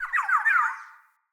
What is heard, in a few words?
Ext Close Bird; Midrath Island